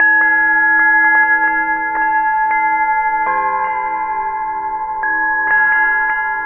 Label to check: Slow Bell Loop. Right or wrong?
Right